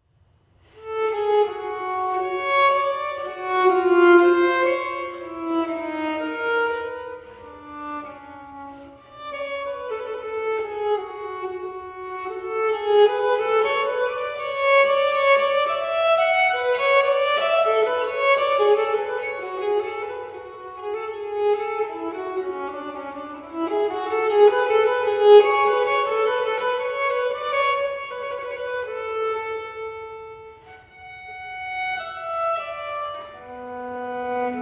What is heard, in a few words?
Prelude in G Minor, Viols; Prelude No 3; Henry Purcell; Wildcat Viols; Fairest Isle